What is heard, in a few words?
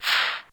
WS CABA, drum; Crypton - DrumBase5000; WS DRUMS